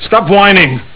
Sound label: whining